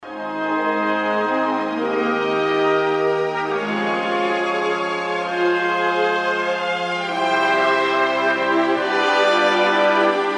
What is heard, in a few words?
Chorale